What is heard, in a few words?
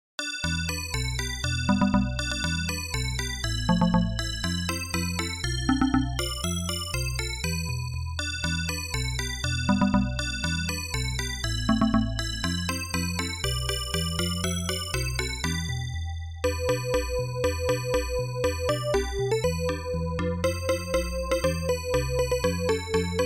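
jingle; ShareWare OnLine Volume 2 (CMS Software)(1993); music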